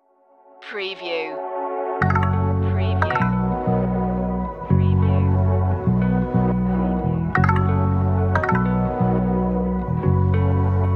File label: delicate flower no drums; dreamlike